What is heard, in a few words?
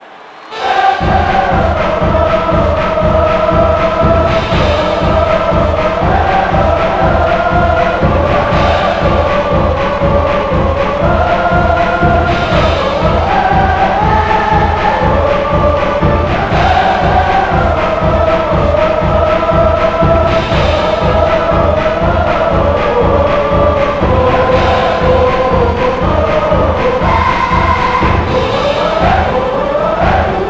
Chants; Seijyoki